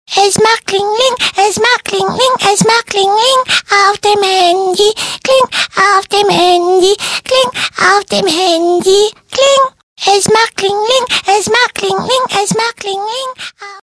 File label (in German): es macht kling